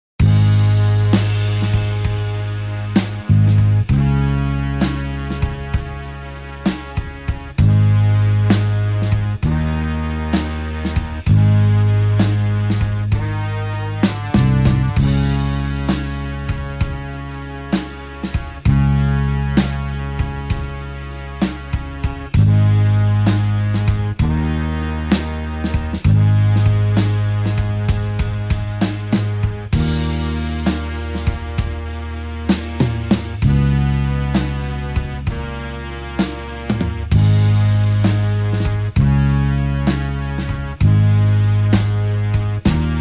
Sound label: blues horns slow Ab